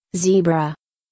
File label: zebra; wild animals